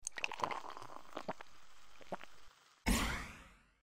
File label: drink Big Pot